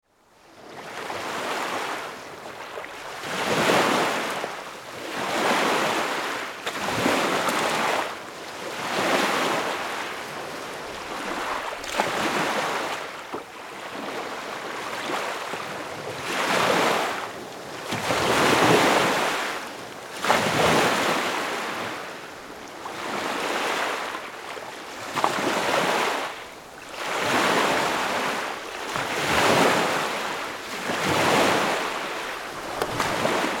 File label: Water Lake